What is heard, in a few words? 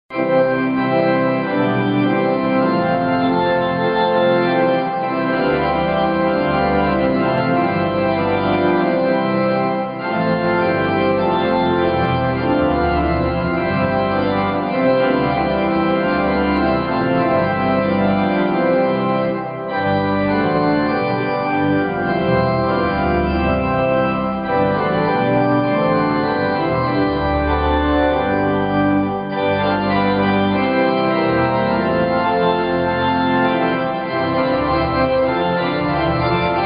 Organ; Christ, the Lord. is Risen Today